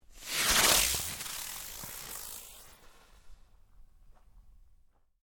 Fireworks Spinner Small Single; Variety of fireworks being detonated

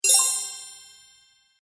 Fairy Sparkle, magic; Magic Sound Effects

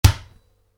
Football bounce, Soccer Ball Bounce On Concrete; Sports; Soccer Sound Effects; Soccer (Sound Effects:Sports); Stuart Duffield

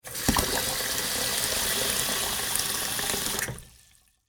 Water Sink Fill With Water; Bathroom; Household

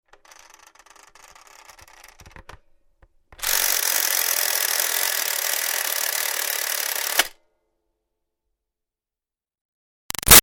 Toy Toaster Countdown Timer Tick; Plastic Toaster; Wind Up Toys

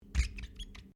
Glass Squeak Rubber; Material components for constructing more complex sounds; Materials

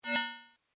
Tech rollover, Rollover: Short, High Tech Effect; Mouseovers; Multimedia; Stuart Duffield

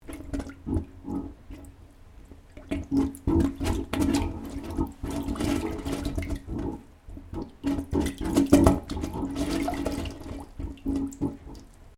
Water Gurgling In Pipe; Weather

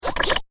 Laser Whip And Bleeps, video game sound effect

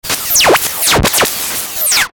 Glitch High Soft Static, Glitch Filter Warble; glitches; Blastwave

